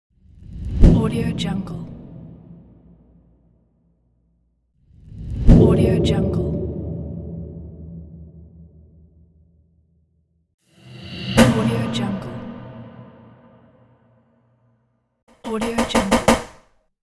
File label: swooshes woosh transition